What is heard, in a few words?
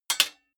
sword sabre guard parry soft short